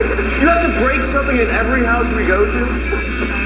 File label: break